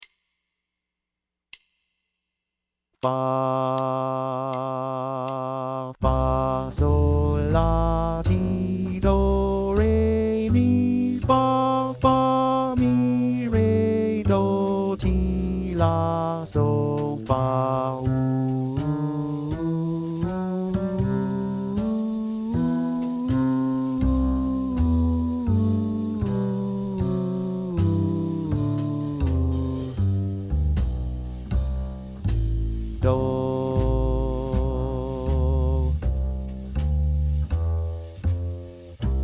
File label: Lydian 80C